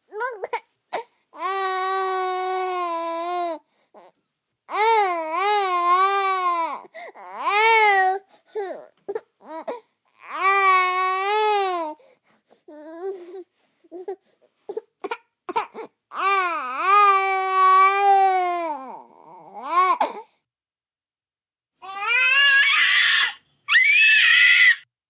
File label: Baby Cry Gurgles, Baby, cry, 1 yr., long, whining, sobbing, human voice, kid, child, infant; Crying; VoiceBox